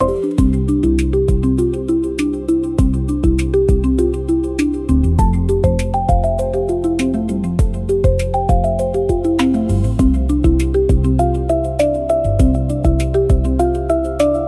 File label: loop